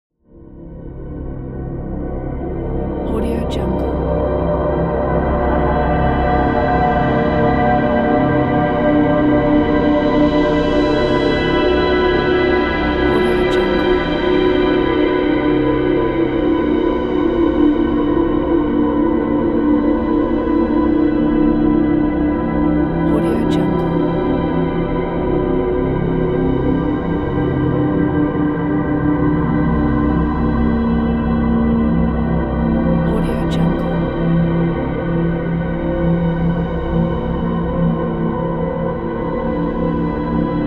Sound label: atmo drone armenian sun high; smartsoundfx